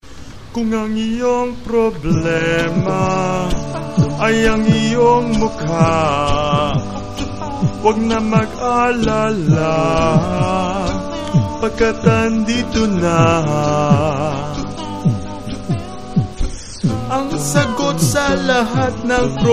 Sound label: acapella